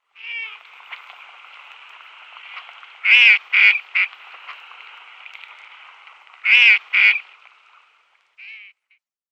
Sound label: Shoveler female